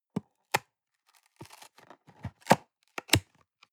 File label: box small open perforated; industrie wirtschaft; bueroarbeit